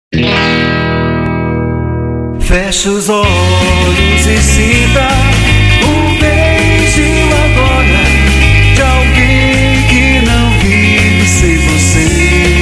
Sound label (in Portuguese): musica ao vivo